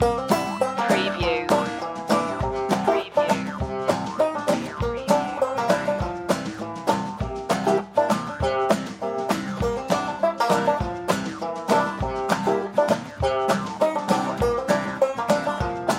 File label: stomp loop